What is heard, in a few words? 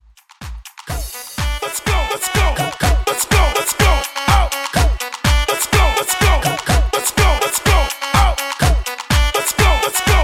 Hype Redrum, 124